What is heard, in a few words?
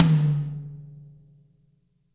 tom maple